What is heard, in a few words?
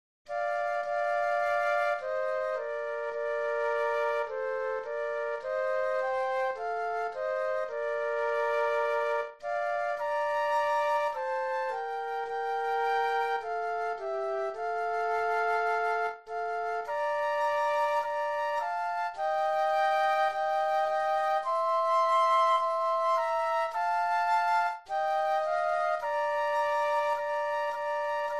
Flute Duet